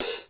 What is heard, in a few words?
MID HH, HR-16; Hollywood Edge; The Big Bad Beatmachine; HR-16B